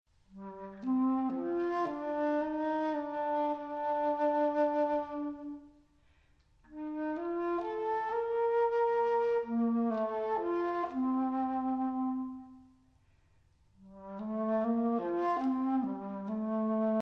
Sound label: melancholic dance; three childrens dances